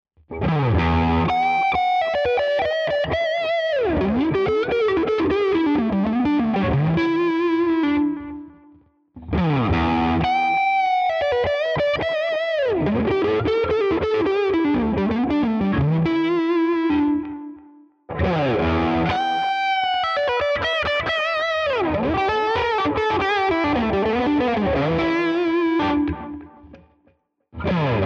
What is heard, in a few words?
Schecter Vintage PT Fastback II B Lead Licks all positions